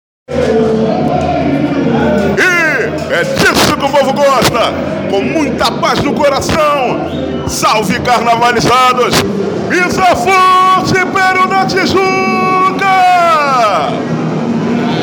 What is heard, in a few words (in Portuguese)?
grito